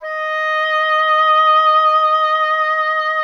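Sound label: oboe, wind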